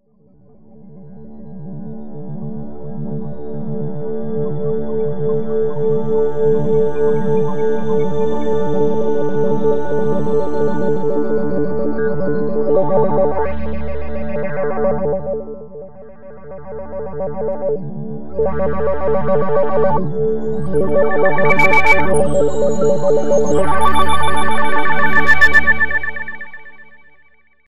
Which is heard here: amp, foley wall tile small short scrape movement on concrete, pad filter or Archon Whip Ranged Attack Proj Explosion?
pad filter